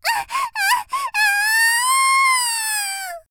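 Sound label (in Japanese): （少女）絶頂「あ、あ、あーん！」, 元気な少女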